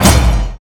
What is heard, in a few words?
Holy Avenger Attack